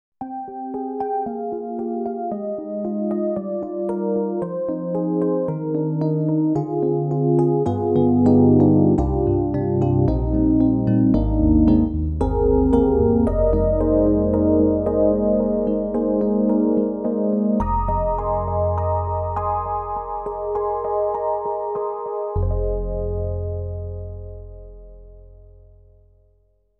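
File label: SD-90 Classical Electric Piano Detuned; EDIROL; Detuned EP1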